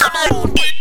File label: VOX FX; Big Beat Samples & More